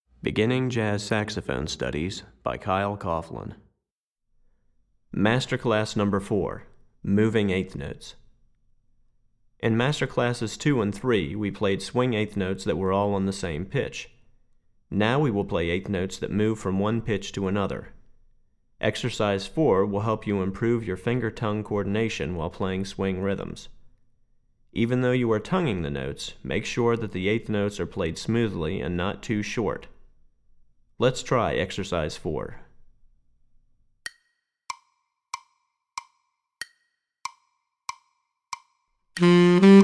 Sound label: Tenor Class, Saxophone; Moving Eighth Notes; Master Class #4; Recordings For Beginning Jazz Saxophone Studies for Tenor, Volume 1; Kyle Coughlin